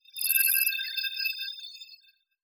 Warp Blast AIM Start; Evolve; Wraith

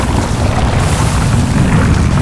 Seismic Blast Staircase Erruption Loop; Evolve; Behemoth

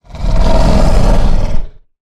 Pain Light Breaths; Evolve; Goliath

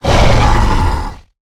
Leap Attack; Evolve; Goliath

